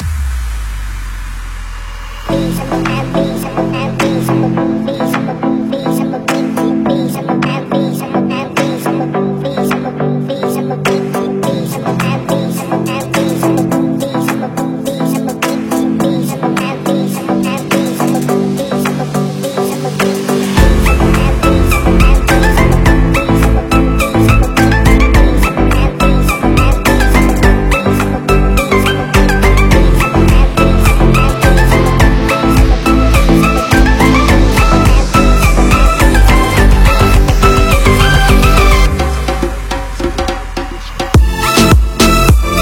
Full Mix 105 BPM

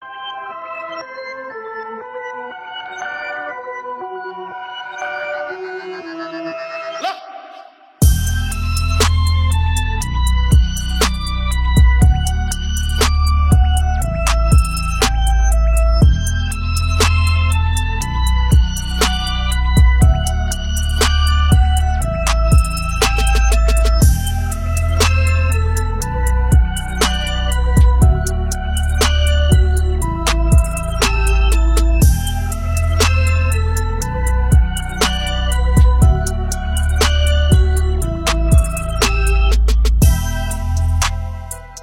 Hybrid Keys; PlaySeries; Komplete

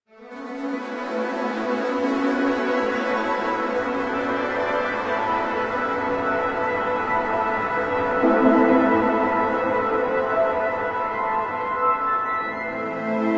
Analog Brass and Winds; Output; Airlift

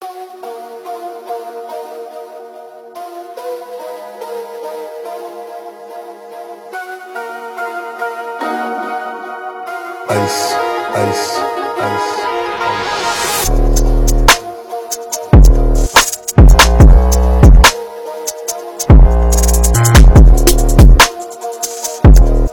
Forest Drill Kit; ICEDIGGER

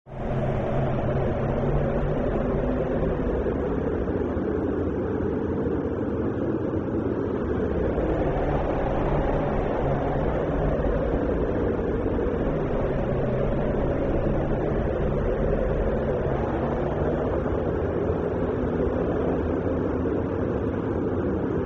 Wind Medium Moody

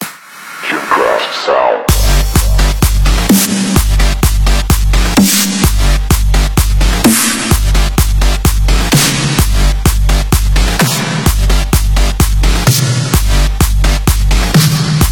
Ultra Fat EDM Snares; Tunecraft Sounds

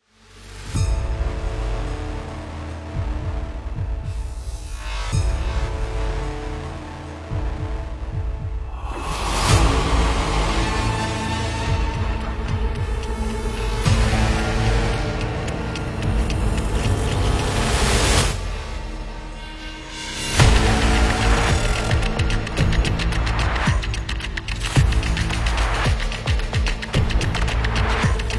Hybrid Game Shooter; OSMusic

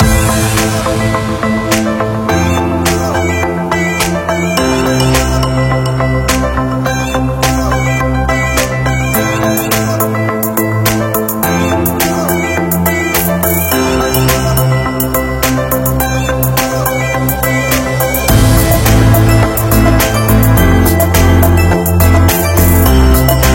Trapical Modern Pop; Function Loops

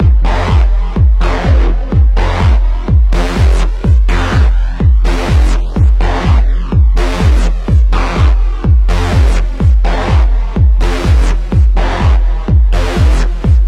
Synth Loop 135 F 125bpm

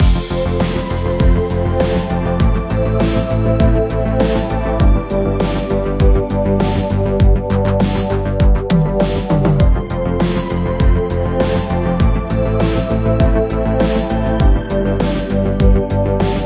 80s Retrowave

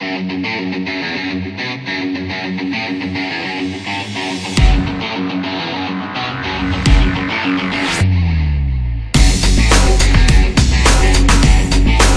Extreme Sport Breakbeat

